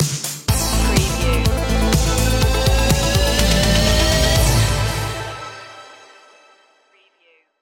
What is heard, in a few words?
8 bit skyway stinger